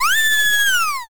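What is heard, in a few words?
scream female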